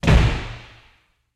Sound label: door slam